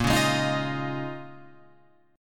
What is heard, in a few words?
Bb Minor 11th, down, Guitar, Open F; strummed; JGuitar